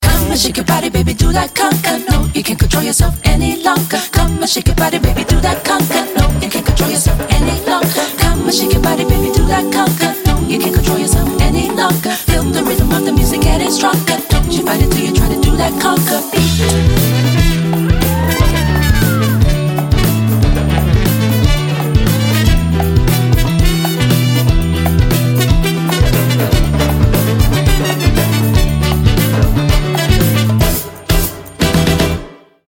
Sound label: conga